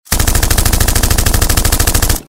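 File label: machine gun burst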